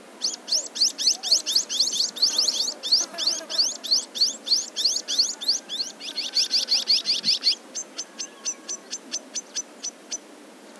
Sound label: indigobird